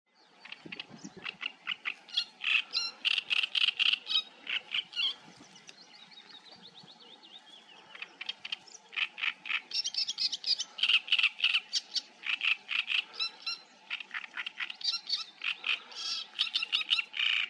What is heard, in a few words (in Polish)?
trzciniak; ptaki